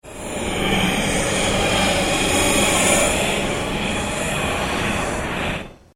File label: Ambient Drone Ghost Chatter